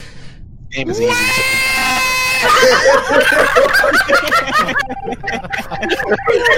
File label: waaaaaaa